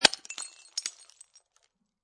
Glass Bottle Drop Empty Break, Impact, Glass Beer Bottle, Empty, Break On Cement